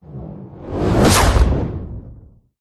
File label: whoosh; Audio Mangler